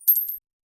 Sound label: generate a short coin sou, objects